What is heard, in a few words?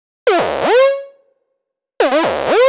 Slide n Ride, Underground UK House & Electro, Synths; Sonic Foundry (Sony Creative Software)